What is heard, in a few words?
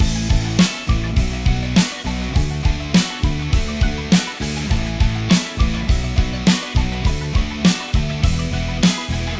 Pop; Avec HHHusher